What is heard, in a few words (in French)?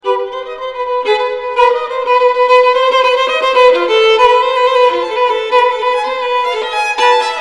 violon